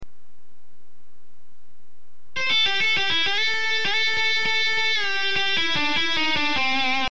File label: blues minor pentatonic exercise, guitar